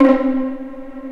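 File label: Vio pizzicato C3; Digital Sound Factory Virtuoso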